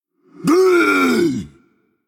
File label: Male Undead Attack